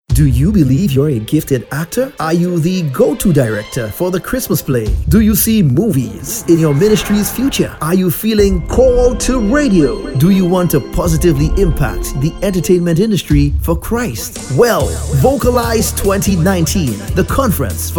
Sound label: vocalise